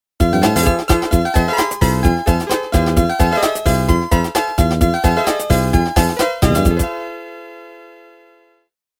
wrong backing

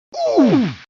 OOF

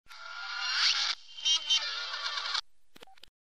meep meep; reverse

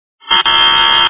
fail; reversevape

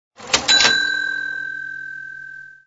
cash; reversevape

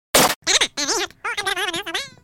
gun

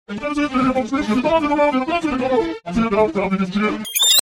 car

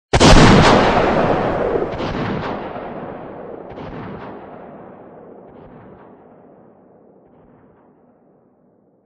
gun

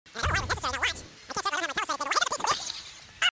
slap

